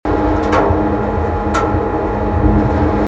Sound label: port metal loop; Odra; Odra Sound Pack; Usłysz Odrę. Historie w rzece ukryte